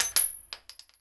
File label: pl shell; xash3d fwgs